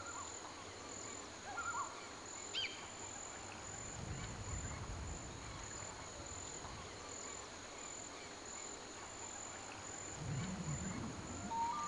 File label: swamp